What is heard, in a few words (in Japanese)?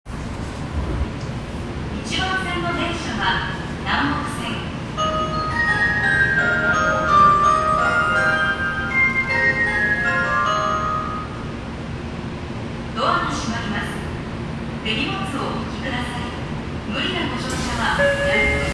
南北線白金台北, train sounds